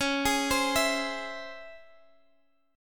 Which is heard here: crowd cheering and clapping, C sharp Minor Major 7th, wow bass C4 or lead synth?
C sharp Minor Major 7th